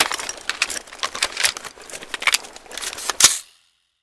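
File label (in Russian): быстрая подготовка пулемета к атаке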